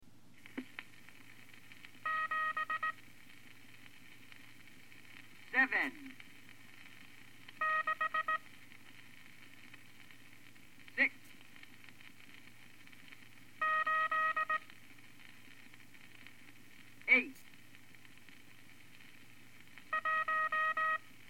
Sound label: Morse Code